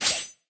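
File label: Goblin base attack, 攻击音效; 哥布林会用匕首不断刺向对方; 攻击音效 atk 初级和经典及以上形态攻击音效; 绿野世界; 部落冲突; 皇室战争; 资源型近战单位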